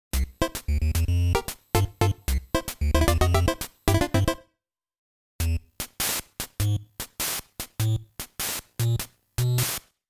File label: Game B Start ~ Scene Change; Game Sound Museum Famicom; Kousenshou Series; Wild Gunman; Duck Hunt; Hogan's Alley